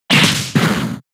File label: Swipe; pokemon sfx gen 6 attack moves xy oras; False Swipe